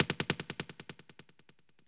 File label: VHS ECHO; drums